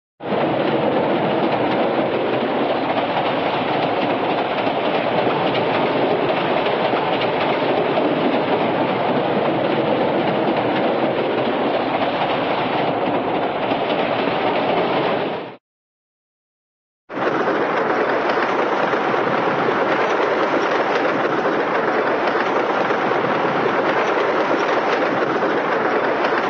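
train, rumble interior travelling with wheel clicks; cartoon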